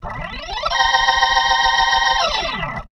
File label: B3 RISE A#, PLAYED